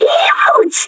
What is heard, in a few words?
pain heavy, metropolice; npc